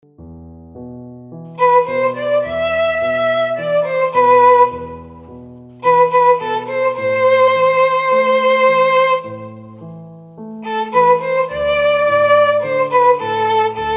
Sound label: violon